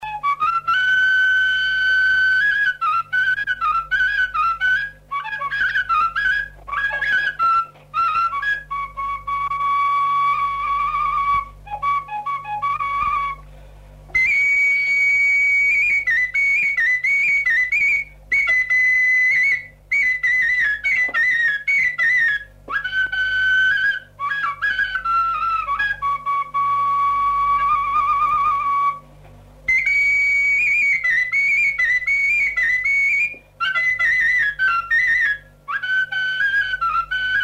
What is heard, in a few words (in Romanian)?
Doina la fluier; Fonoteca Arhivei de Folclor Vasile Adascalitei - CJCPCT Vaslui; cantece de dor si jale